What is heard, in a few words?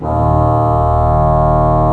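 pedal D#1, organ pedal; AKAI S-Series CD-ROM Sound Library VOL-2; ORG PDL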